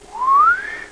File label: whistle